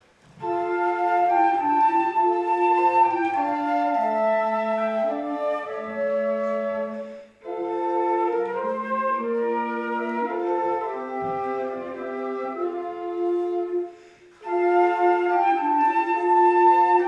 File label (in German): Intrade; Paul Peuerl; Gottesdienste zum Nachhören; Osterfestgottesdienst